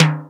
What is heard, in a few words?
Timbale Lo, Korg 05R-W Korg Percussion; 300 Drum Machines